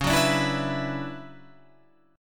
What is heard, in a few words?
D Major 13th C sharp, chord